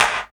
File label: CLAPS HIP, CLP; 90 s Sample CDs; Best Service Dance Mega Drums